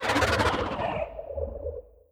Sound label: ship engine idle; Stellaris